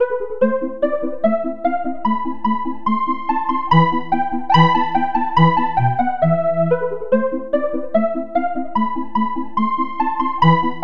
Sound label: calliope lead